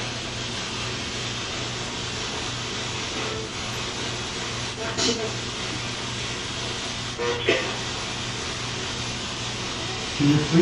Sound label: Ghost box; Ghost box group session; watching you ok